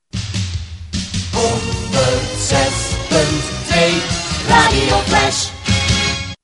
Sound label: jingle; Flash